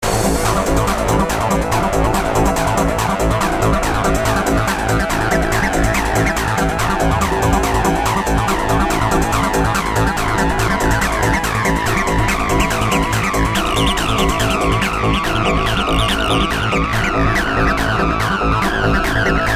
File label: 303 acid; Jumping-TB: Kawai K5000S, Legato-TB: Yamaha AN1x, Tube-TB: Waldorf Microwave XT